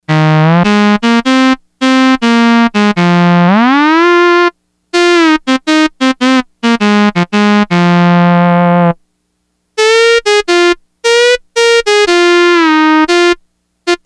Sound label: Mono Sax, Yamaha DX100; Factory Patches